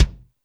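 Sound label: kick Stomp, Alesis D4 drum machine; 300 Drum Machines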